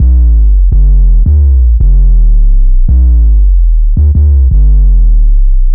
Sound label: Hard Sweep Down, Bass Lines 166.5; Zero-G Jungle Warfare 1, 2 & 3; 90 s Sample CDs